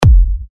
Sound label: TECHKICKS F, techno kicks; Agent47; revealed techno kicks vol 10